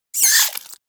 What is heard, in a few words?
Vocal Pain, Hunting Critters; Nexifera